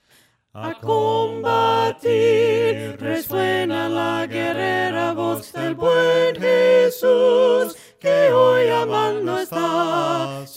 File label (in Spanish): VOCES, himnos a capella; cantos del camino; ¡A COMBATIR